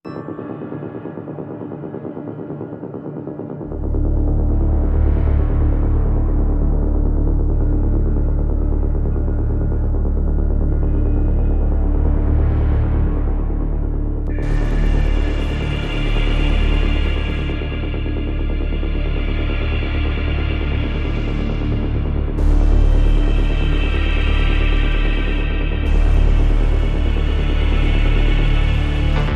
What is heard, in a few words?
Pads and Pulses Only